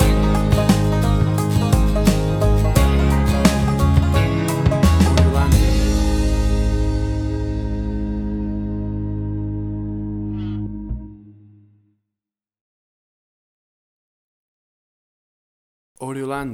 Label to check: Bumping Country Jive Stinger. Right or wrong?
Right